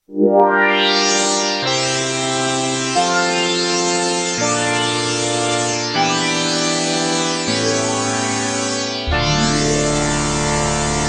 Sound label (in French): synthetiseur